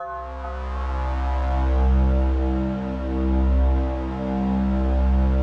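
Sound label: PAD, atmospheric pads; Best Service; XX Large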